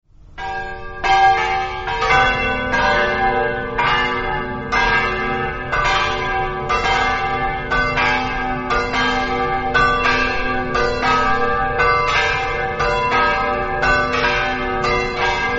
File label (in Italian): cjamin, campane